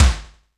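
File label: snare, funk kits; Crypton - DrumBase5000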